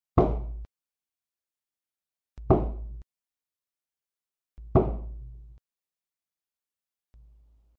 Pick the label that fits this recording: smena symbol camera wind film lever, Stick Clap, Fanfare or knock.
knock